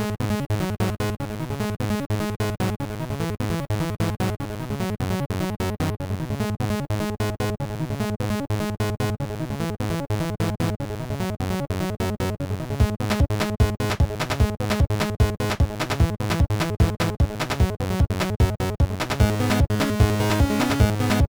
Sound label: drums